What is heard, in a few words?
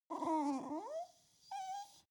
animal dog whimper really sad; smartsoundfx